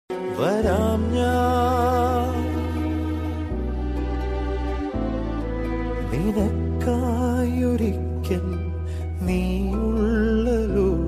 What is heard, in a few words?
Malayalam Ringtone